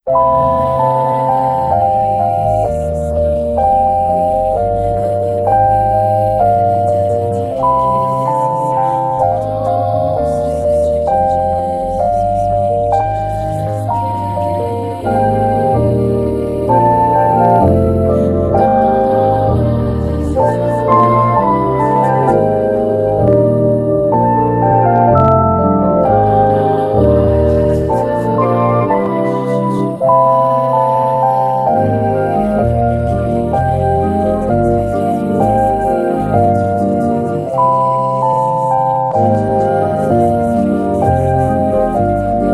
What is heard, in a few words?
instrumental